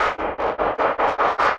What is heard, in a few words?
Ambi Fex, 150bpm; rhythmic inspiration